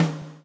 SWNG

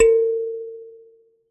Finger, Prepared Kit; Battery 3 Sample Kits

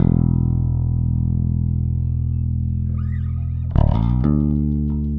Rock, Bass; Bass X

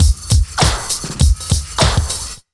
100 Beat, Underground Hip Hop; USB Soundscan vol.02; AKAI

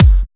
Bassdrum

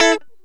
Lounge Guitar Chick Major A2; Zero-G Kocktail Kollection

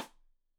Side Stick Stereo; Deeply Sampled Drums

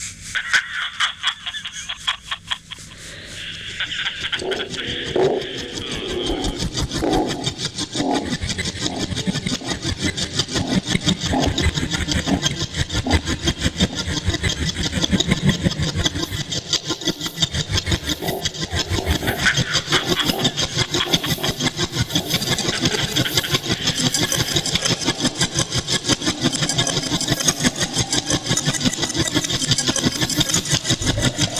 Kiosk Monster F; Zero-G - Ghost In The Machine

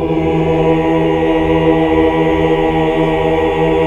AAH 2 5 R; Best Service Gigapack 1

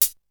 Hat, Drums; ZERO G - Datafile DISC 3

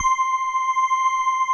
HOLLOW ORG; Greytsounds; Scott Peers Sound Engineering

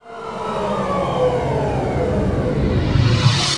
DISSOLVE, Trance; ILIO; Trance Fusion; STOP LONG

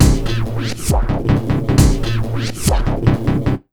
Big Beat Drumloops, LOW 135; AKAI

